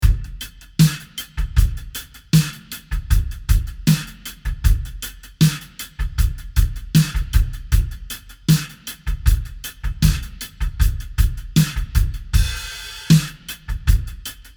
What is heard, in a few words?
Drums